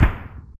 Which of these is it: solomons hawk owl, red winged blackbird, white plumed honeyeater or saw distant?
saw distant